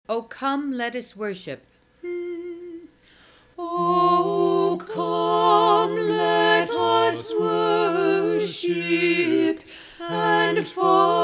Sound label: come let us worship SAB, choir